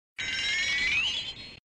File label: Ping; Gundam Newtype